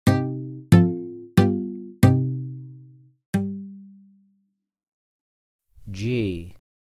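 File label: G2 Acoustic Steel Muted Guitar Minor Note Name; ear training instrumental color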